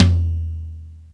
high floor tom e key, Royality free tom drum tuned to the E note. Loudest frequency: 827Hz